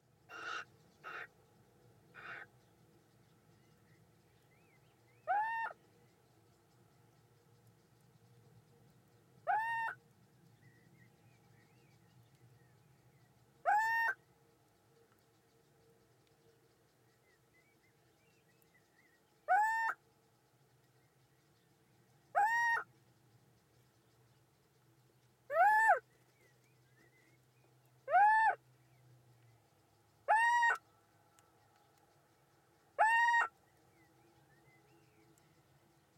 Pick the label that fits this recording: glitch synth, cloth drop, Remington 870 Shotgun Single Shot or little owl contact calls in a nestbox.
little owl contact calls in a nestbox